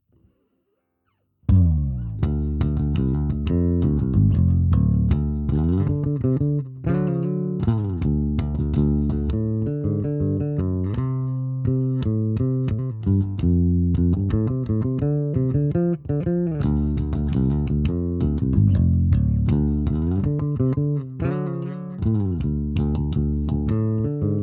Ampeg 1x15 Flying V; LWA500

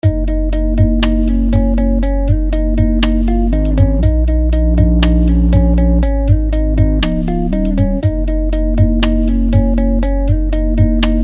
Beat Pump Baseline; CrushShaper

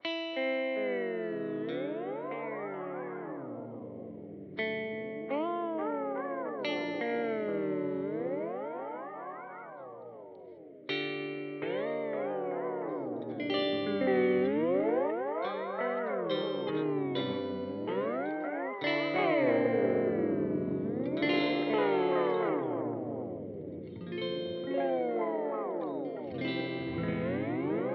Memory Boy Modulation

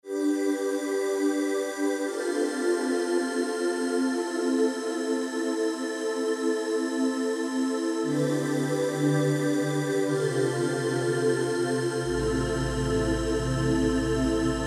hyper pads

